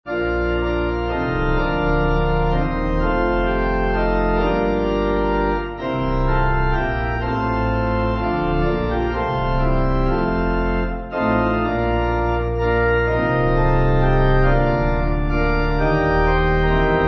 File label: We Have A Gospel, Pipe